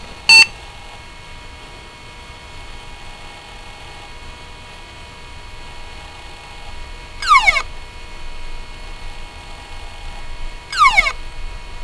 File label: odd disk tone